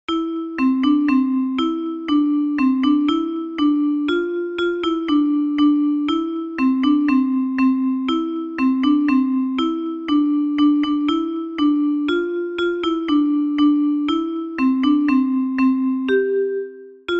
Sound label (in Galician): Metalofono Contralto